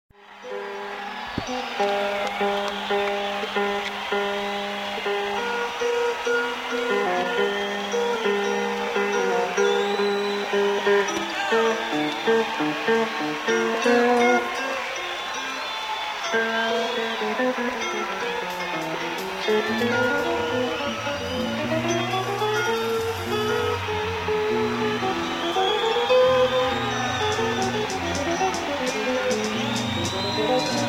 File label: Crowd Tuning